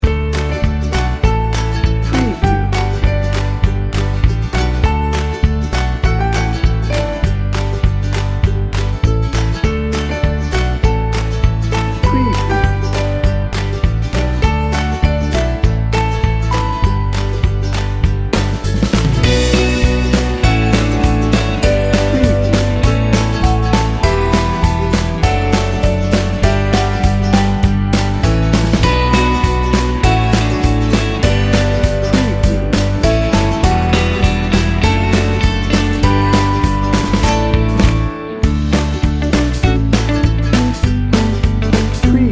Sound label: sweet happy upbeat folk pop dnb